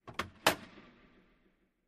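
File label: Hockey Penalty Box Door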